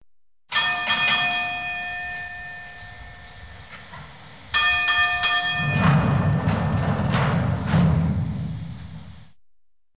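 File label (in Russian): shakhta podemnik zvonok zakryvanie dveri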